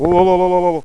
indian call, human sounds

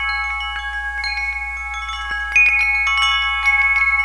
bluebird, windchimes sounds

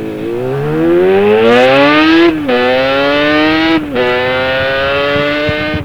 accelerate, motorcycle sounds

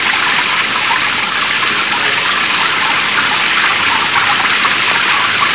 rain, rain sounds

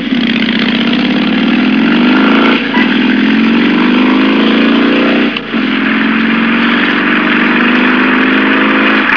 up to speed, motorcycle

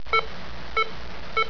heart monitor beep

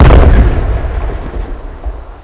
Explo Classic, explosion sounds

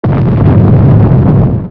impact samp, explosion sounds

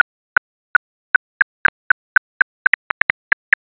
free tailed bat feeding

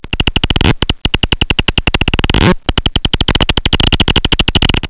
bats

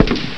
rifle fire single

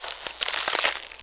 ice crunching

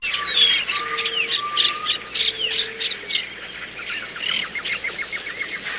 tropical forest

